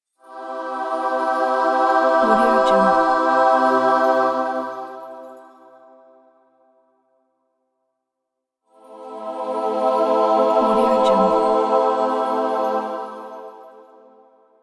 angelic reveal choir

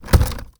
impacts source plastic fridge punch; robotic lifeforms 2

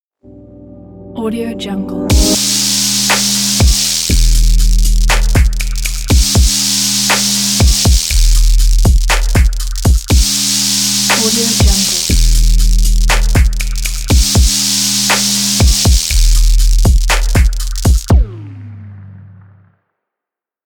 brutal logo pack

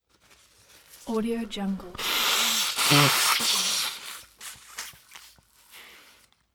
handkerchief nose blow

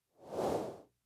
cloth whooshes whooshing pass bys varied speed

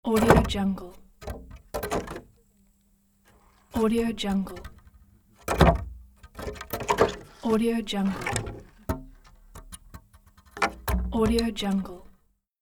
wooden fence boards

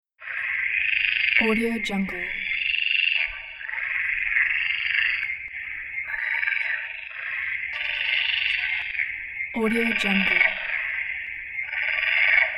stereo frogs

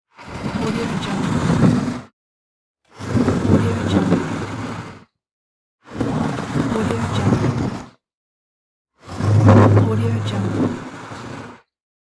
forniture move

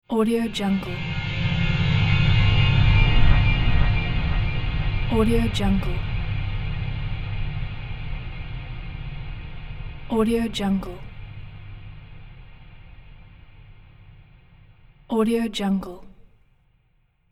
twilight transition of fantasy horror

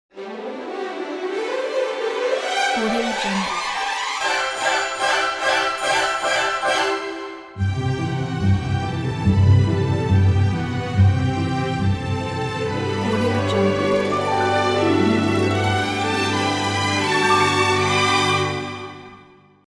excited fairytale orchestral cue; voicesofla

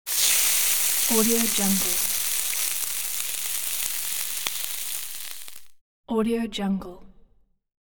meat sizzle

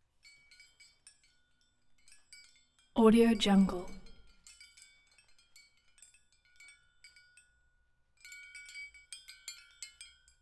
goats bells metal ringing chimes

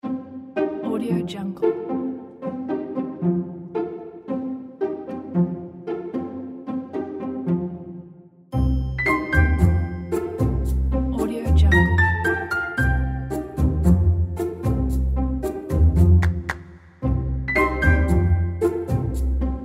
funny

